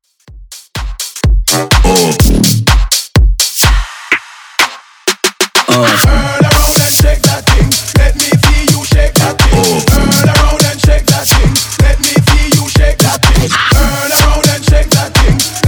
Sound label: Bass House 125bpm; Reworkz